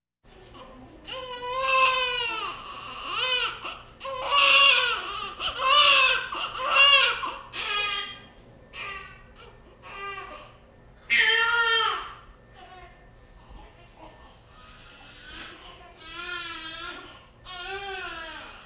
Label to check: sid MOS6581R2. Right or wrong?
Wrong